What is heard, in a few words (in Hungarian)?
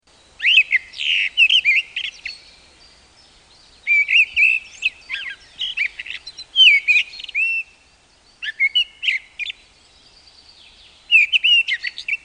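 Léprigó; Madarak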